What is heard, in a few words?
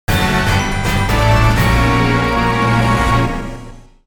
Get Item; Castlevania Judgment; Wii